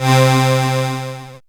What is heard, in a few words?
synth c, Dance Synths, Juno; ProSamples vol.37; Juno Jam; Best Service